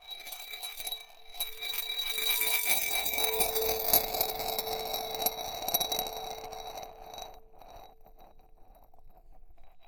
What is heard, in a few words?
Marble Spin, Large Wine Glass